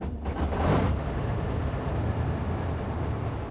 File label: start engine, engines; World of Tanks